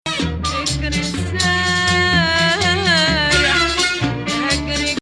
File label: Musta3ar